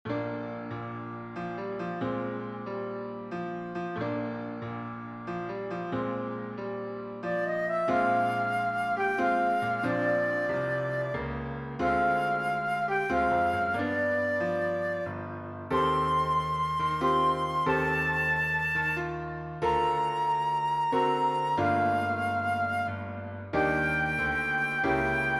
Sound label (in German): Sille Nacht fast ein Blues